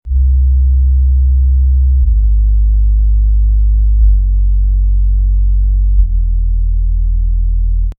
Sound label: sub bass example